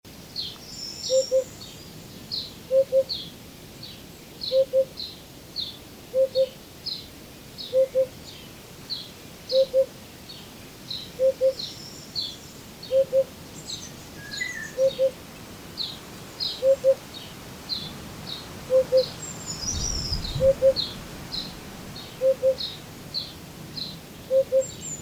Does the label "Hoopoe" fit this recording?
Yes